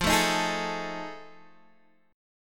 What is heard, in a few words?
F 7th Sharp 9th, Guitar, DADGAD; F7#9 strummed; 15,12,13,13,12,x